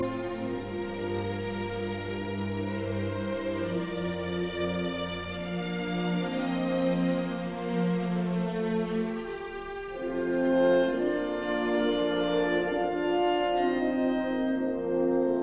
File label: Symphony in D minor Choral Adagio molto e cantabile Andante moderato; Symphony No 9; Op 125